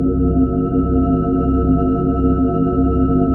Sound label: SYN ATMO; Sound & Vision - Gigapack II